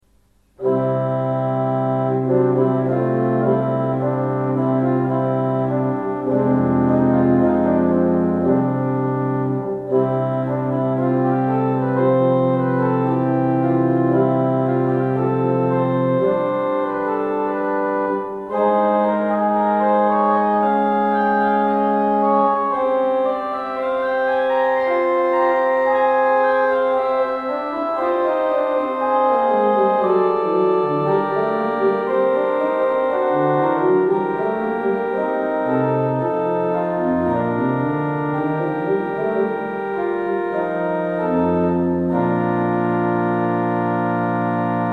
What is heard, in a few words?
Geigenprinc 8